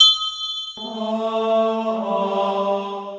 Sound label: priest turn undead